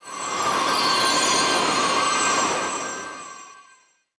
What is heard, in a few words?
effect blessing wind; feheroes